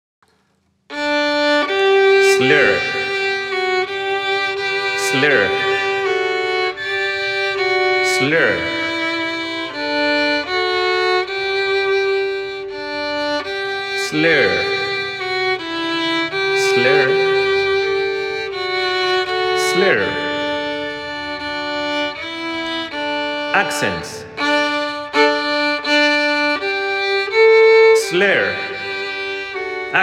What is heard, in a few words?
Hark the Herald Angels Sing, violin